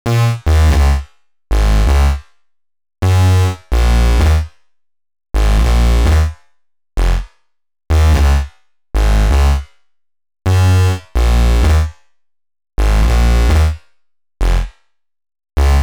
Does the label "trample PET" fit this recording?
No